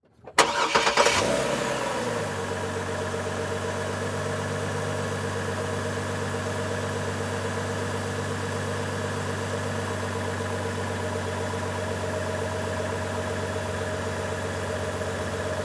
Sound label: Transportation Truck Envoy Start Idle Off Exterior